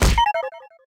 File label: Item Remove; SM3DL